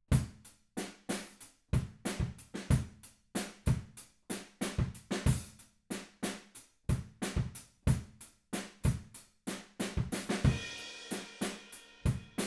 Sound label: Room